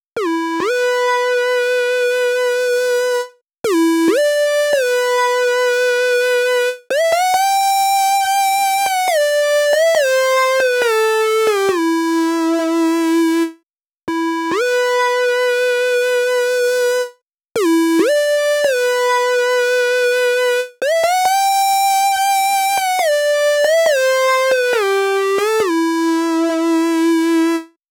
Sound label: Freefall Kit 138BPM Main lead DRY, E minor; Unlooped; Vengeance Samples